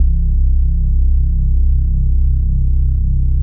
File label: bass pad